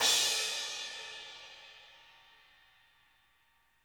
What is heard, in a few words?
crash; Sonic Reality; Interactive Drum Kits; 90s sample cds